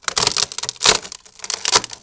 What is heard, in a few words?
dryad die